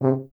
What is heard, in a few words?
tuba; Miroslav Vitous - Solo Instruments VOL-2